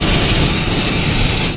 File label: steam scare; env; svencoop; echoes